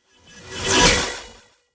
Aggressive Transition

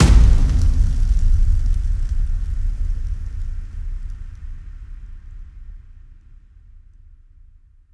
Impact

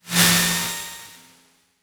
Aggressive Transition

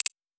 Notifications and Buttons

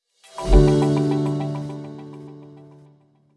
Corporate Transition

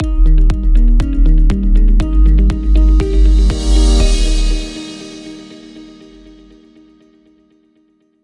Corporate Transition

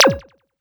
Game Sound

